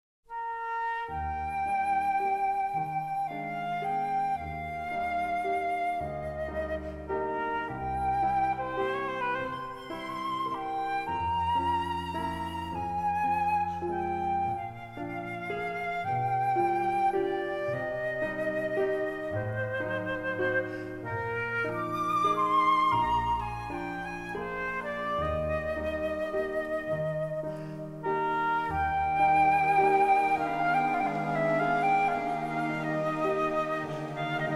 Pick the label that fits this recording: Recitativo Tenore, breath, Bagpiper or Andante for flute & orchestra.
Andante for flute & orchestra